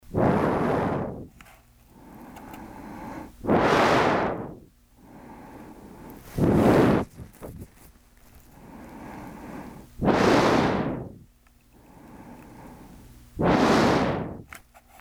Blow into field recorder

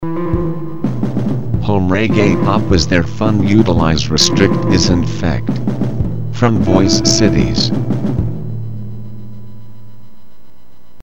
raggae pop

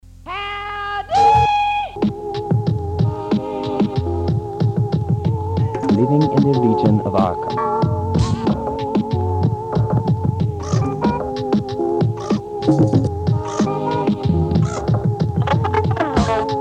Zombie Dirge

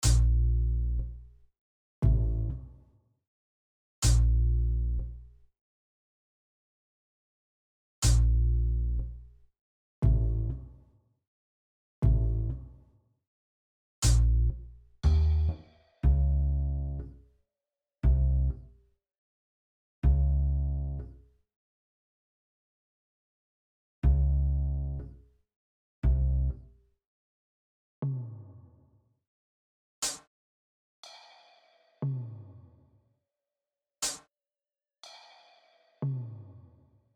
Bass solo

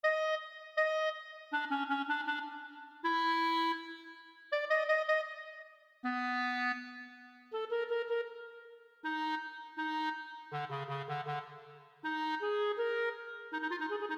clarinet

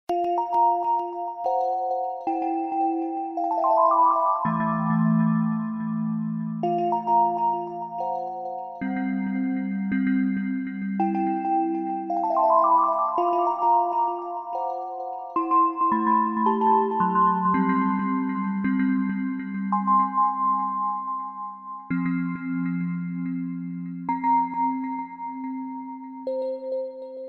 synth

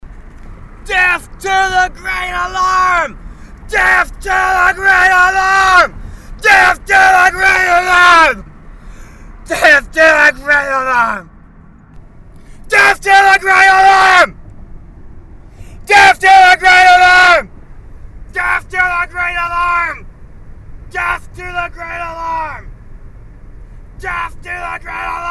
Death chant

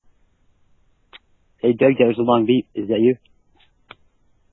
Long beat

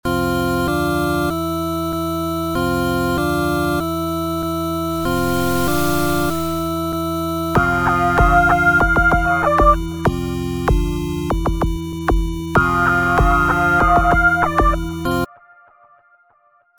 early riff

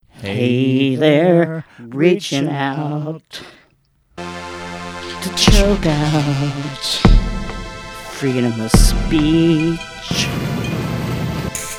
Electro Skeletal Laffs

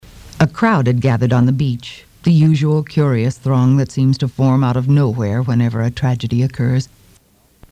Beach crowd

